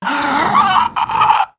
female zombie pain; nmrih; zerosiki; slender

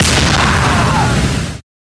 swing hit; hoseong

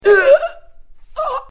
death; cof; simon

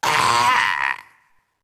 gorefast hurt; kf2; gorefiend; slender

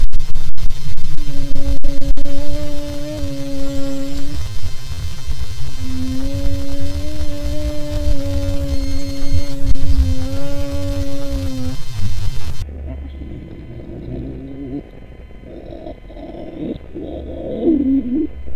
engine; whiteface

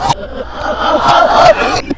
normal idle; floaters; slender

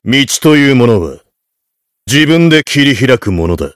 heavy positive vocalization

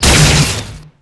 slow sound; chiabihamaru; cv project; invading executioner; zerosiki; slender

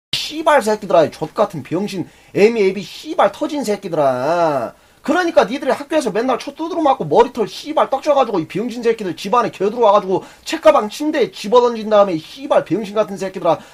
scout positive vocalization

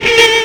baby pain; slender

